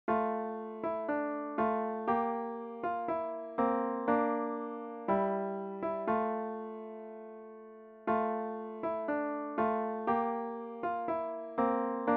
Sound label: piano solo